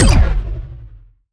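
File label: Sci weapon Shot Medium Blaster; Power Penetrator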